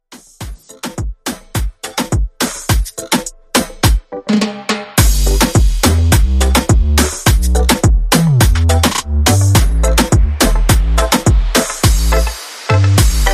Moombah Bootleg Reboot

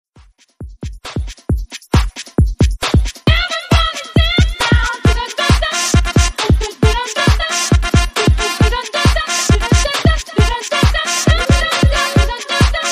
Top40 Hype Redrum 135 Bpm

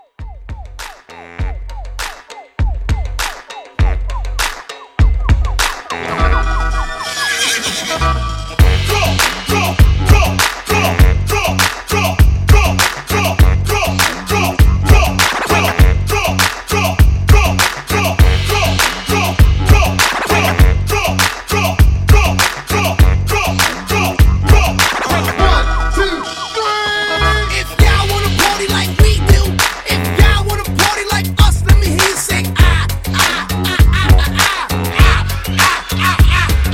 Partybreak Intro

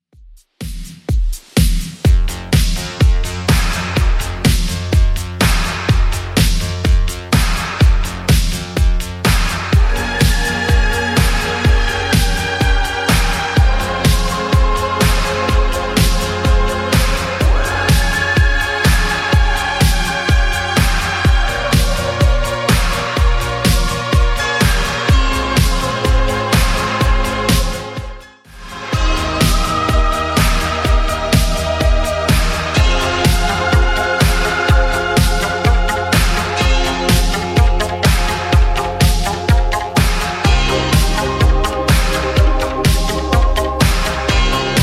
80s Redrum 125bpm

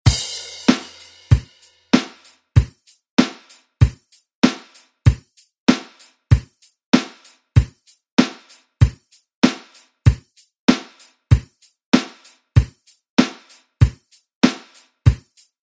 70s ReDrum; DJ Mhark

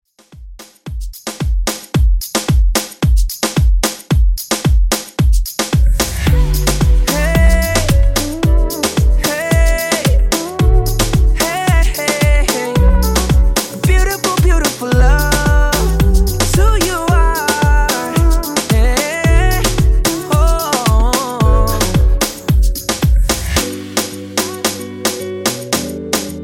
Top40 Redrum 111 Bpm Clean

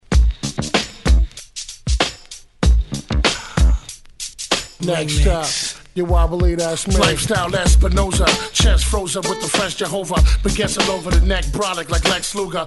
remix 90s intro 95 Bpm clean

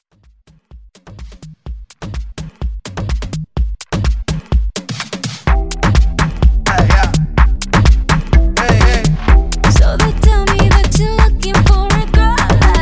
Latin Pop Redrum

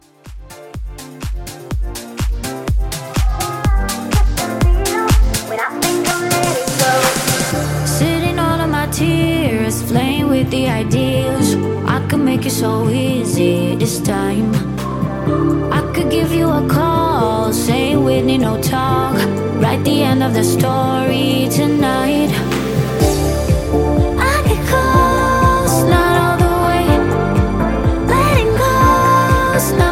House Blend

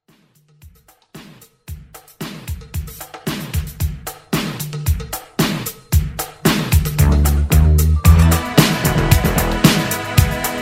80s Redrum

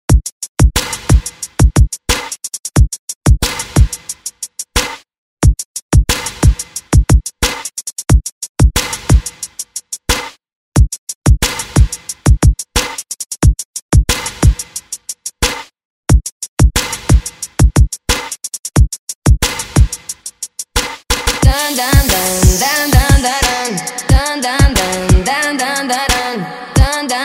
Top40 Redrum

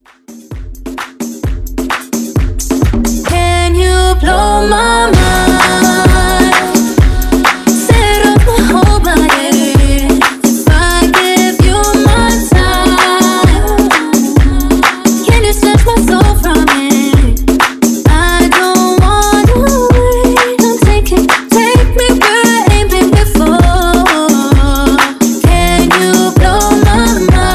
Riddim Rework 130 BPM

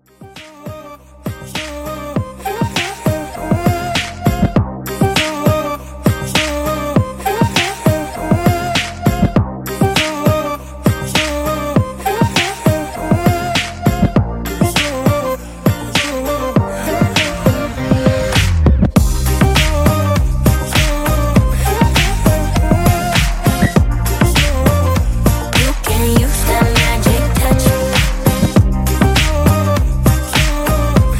Dance ReDrum 100Bpm

